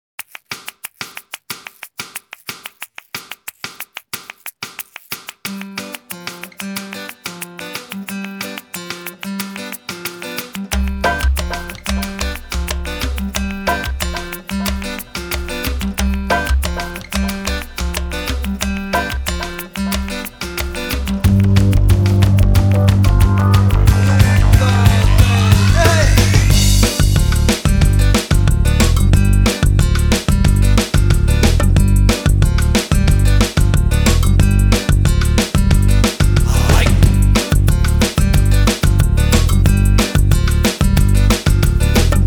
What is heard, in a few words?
Bossa nova